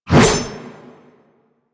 Wraith Reaper Melee Whoosh; Warframe

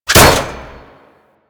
Radial Blast Impact Metal; Warframe; Excalibur

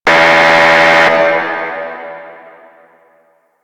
factory doors open alert; Venus; Warframe; Veilbreaker; Quests; Kahl

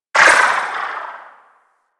Pistol Tail; Sapient

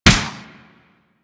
Space Ship Turret Fire; Pursuit Space Ship

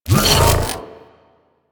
Shockwave Leap; Warframe; Venus; Venus Terra Shockwave Moa; Abilities

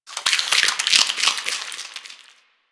Cave Insect; Ambience; One Offs; Insects; Warframe; Quests; The New War; Drifter Camp

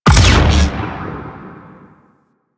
Beam Fire; Enemies; Abilities; Warframe; Corpus; Arachnoid Camper Terra

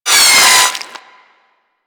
Flesh Impact, Weapons; Warframe; Tenno; Miter; Hit Effects

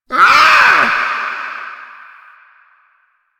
Ambient Meow Far; Warframe; Kalymos

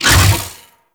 Hammer Shield Whoosh B; Crp Hammer Shield

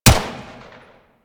Pistol Shot, Weapons; Harlequin

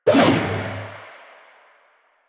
ball throw foley; Warframe; Stalker

